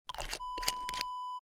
small glass bottle close

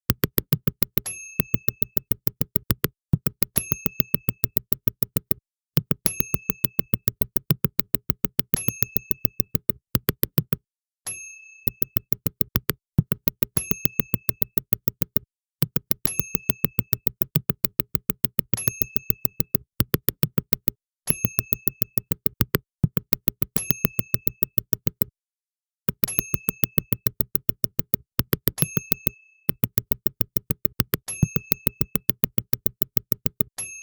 Machine Gun

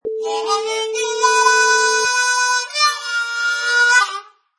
Harmonica Train Riff

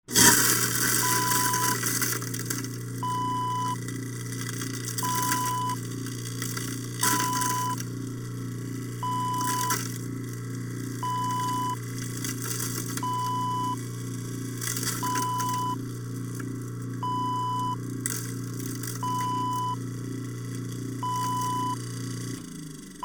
garbage disposal

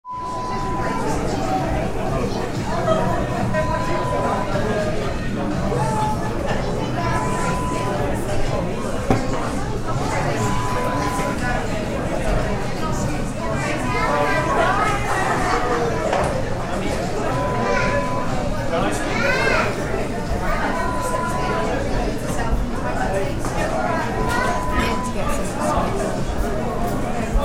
Restaurant sound

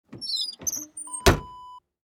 car trunk close

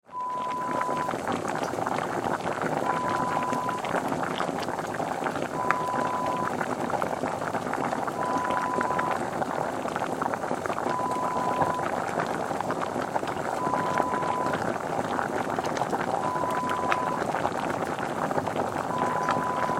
bubbling sound effect

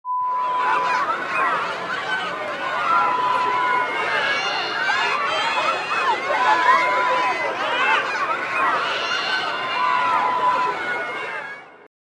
Playground sound

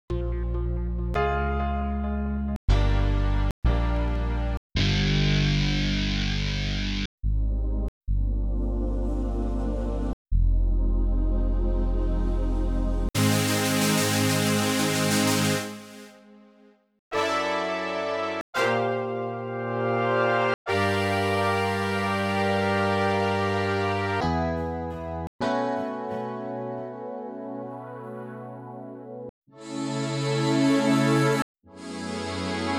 korg m3

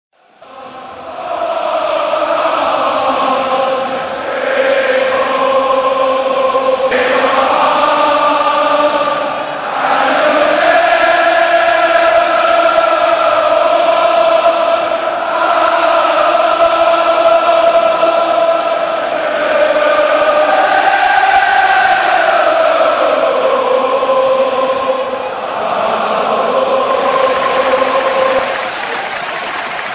applaus; Liverpool; fan chants

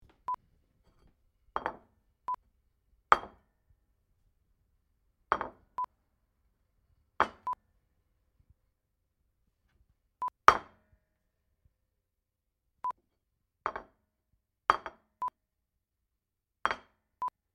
Plate On Surface

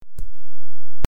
Body Punch

